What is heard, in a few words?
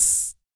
VOC PERC, Vocal Planet Jazz FX BASS PERC